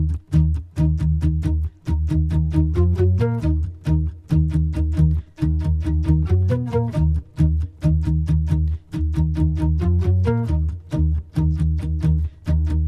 BG Tone

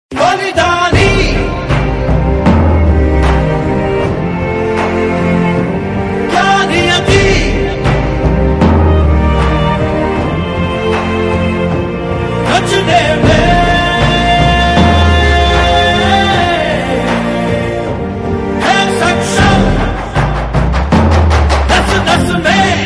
ringtone; baahubali 2

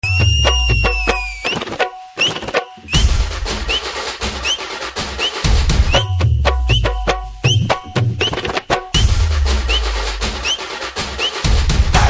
Whistle Ringtone

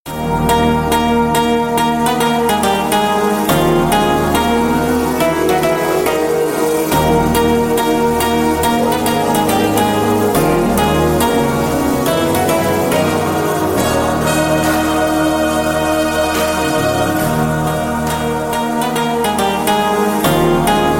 Telugu BGM Ringtone; GodFather

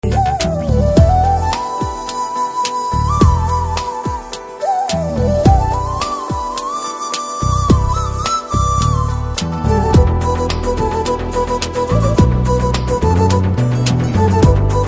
BGM Flute

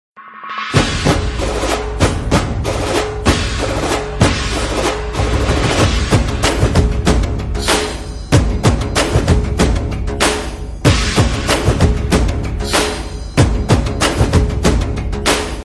Drum Beats

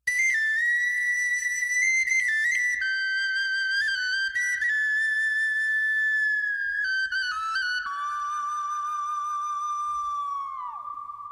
BGM Ringtone

